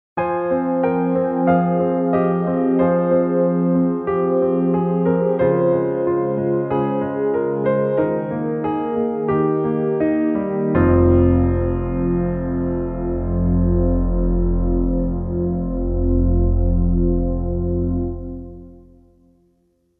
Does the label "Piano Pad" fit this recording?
Yes